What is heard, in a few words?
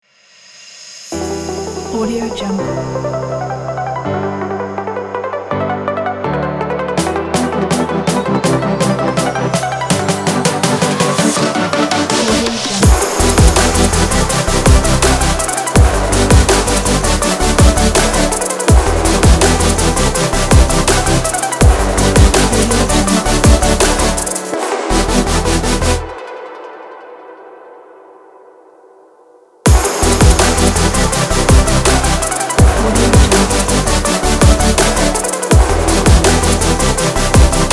future bass kit; eliansproductions